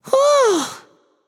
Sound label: ugh, voicepack; smite; jorm